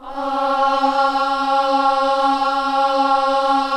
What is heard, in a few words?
KIDS CHOIR, Gospel; Spectrasonics; Vocal Planet Vol.1 Gospel; akai